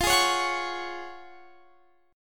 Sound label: Gb Major 7th Suspended 2nd F, chord